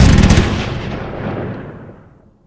mgsj fire end npc

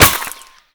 impact dirt; Modern Warfare

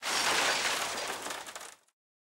aa explosion debris

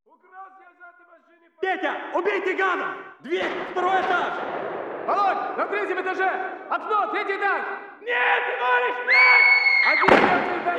village assault killings dist; Call of Duty Modern Warfare

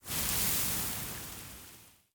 ship engines; World Of Warships

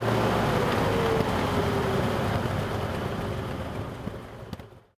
engines; World of Tanks

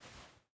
ironsights up; Rust

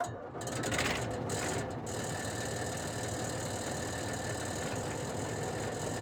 engines; World of Tanks

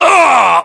death, english; voiceovers

